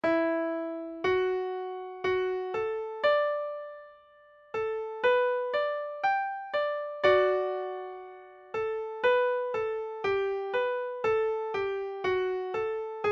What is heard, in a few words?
harmony, session tune midi